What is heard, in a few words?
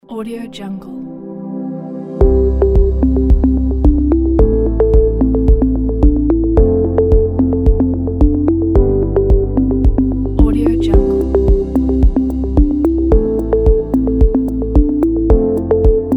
ambient tech